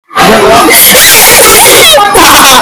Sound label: laughing